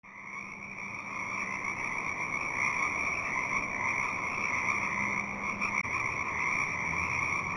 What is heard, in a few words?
frogs clean